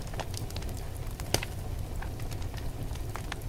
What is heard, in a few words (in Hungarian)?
Fehérzaj; relax compact hang es dallamterapias feher zaj keszulek